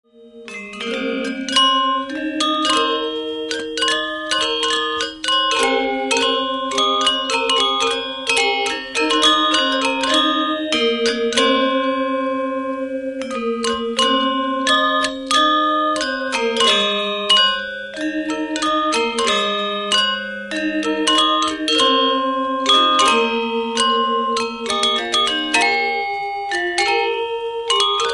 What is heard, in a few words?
Gamelan; varese